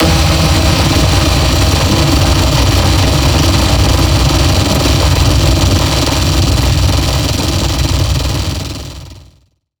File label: glitch thing